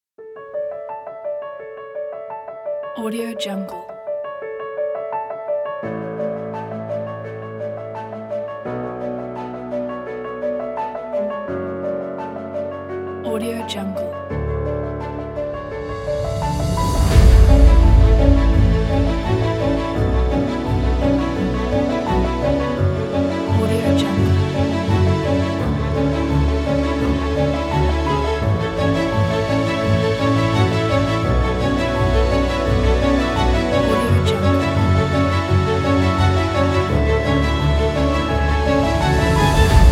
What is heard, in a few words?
inspire piano